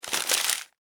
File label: Office Paper Crumple Short; Materials